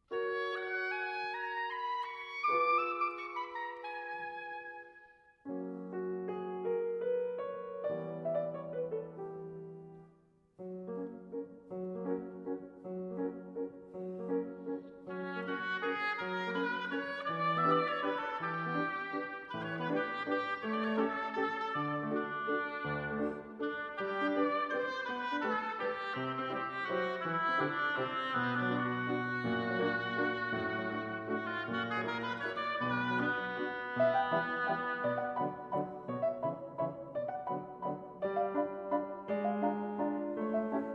Oboe & Piano, Seguidilla and Duet; Carmen, WD 31 (Excerpts Arr. for Oboe & Piano)

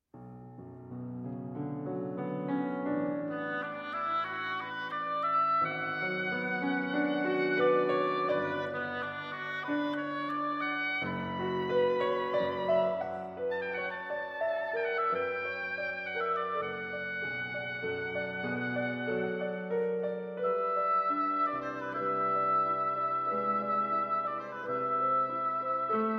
Fantaisie Caprice for Oboe & Piano

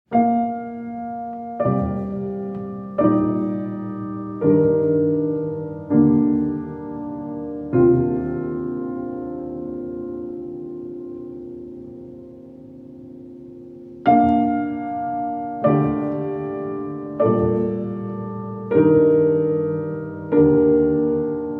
Fanfare; After Jeromos Kamphuis

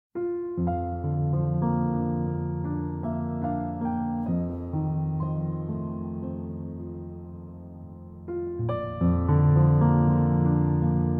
Solo Piano

